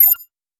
create ui and menu sound

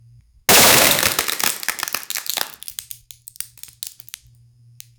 louder glass cracking imp

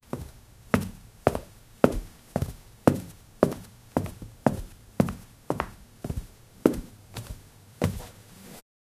footstep on floor

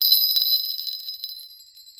particles bells, musical

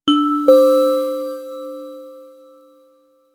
luxury app notification; digital

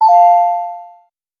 game sound effect for success

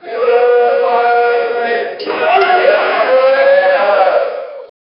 the cacophony of a fast, thwack

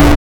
i want a kill feedback

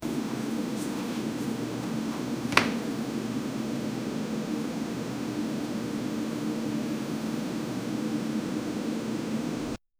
household noise in an ord, apartment

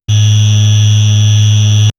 short mobile phone vibrat

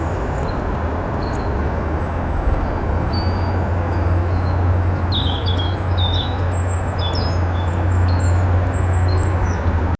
light park ambience birds

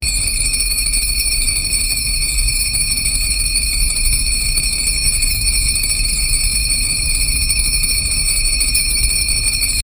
christmas lights going on

